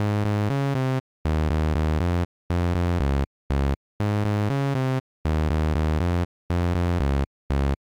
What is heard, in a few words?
bass